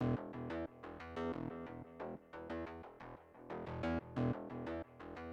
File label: music interactive; World of Tanks